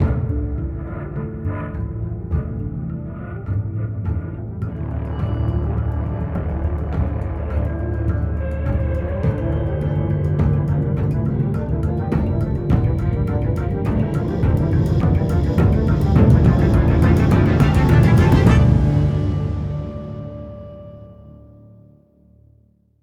env flagship Interior escape

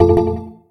Ingredient Wrong

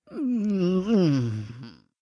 Disco Sleep; Cut the Rope: Time Travel

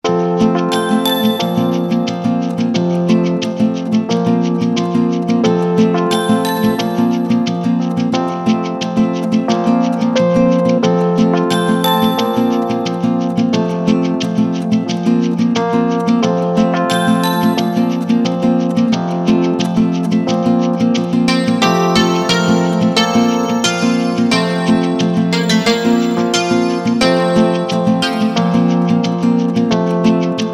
drumless ver.; Cotton

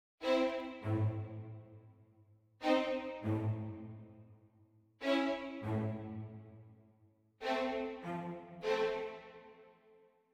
mysterious med start

